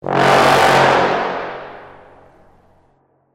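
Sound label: Sting Medium Brass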